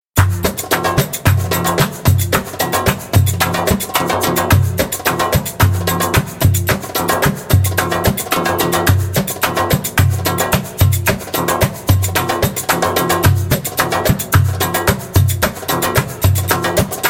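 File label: Inverted Maqsum fast spoken, djembe; Djembolale - Grooves and Moves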